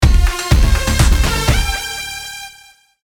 Funky; The Voyage variants

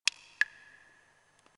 Drop, notifications; Coolpad; ESurfing-No1-2021

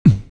Move, Keytone sounds; A200; LG

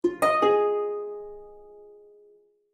Relaxed Melodious; BlackBerry; OS-4.6

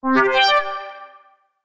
Wireless Charging Started; Motorola; Moto-G4-Plus

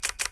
camera shutter; Acer Liquid Zest 3G Z525

